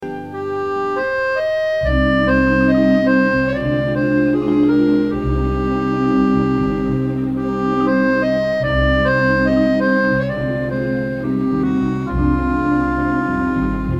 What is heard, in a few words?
Harmonica